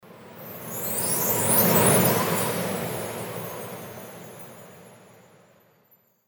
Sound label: CHRISTMAS WIPE